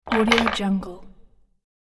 wood game pieces drop

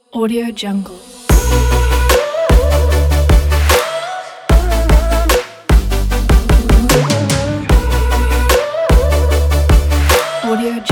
future bass logo

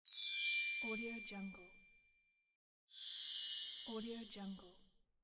sparkle shine

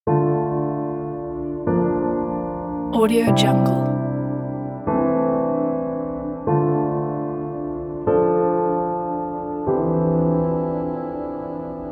sadness; cinezar

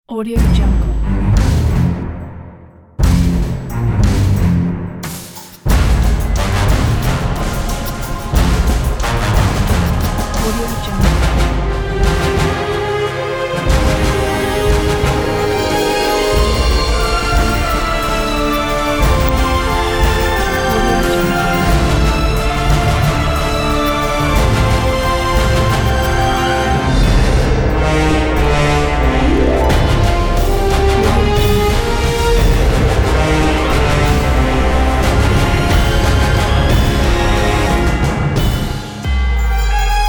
medieval victory

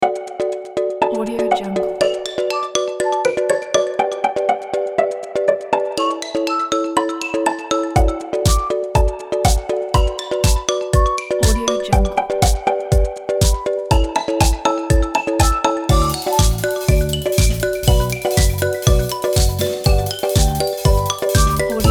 positive and happy children music

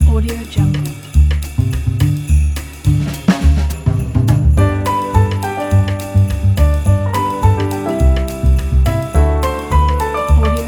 jingle bells

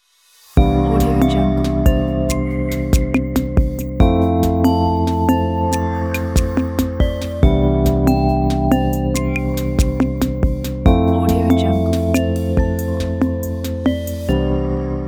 ambient ident; studioetude

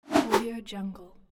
double swoosh

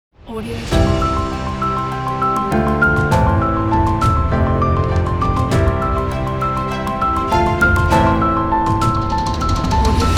orchestral inspiring awards logo

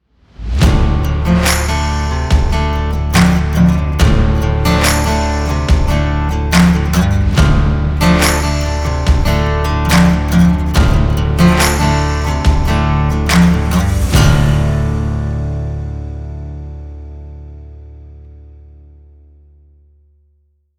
western logo

